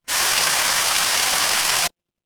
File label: water stream